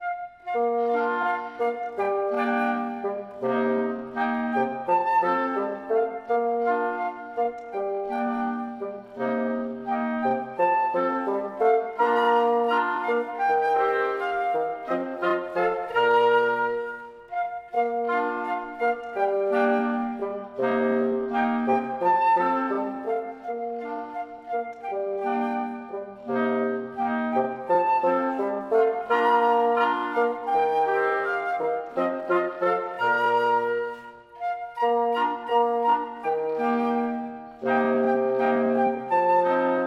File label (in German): Uebeversion Lied Holzblaeser B-Dur langsam; Rabauken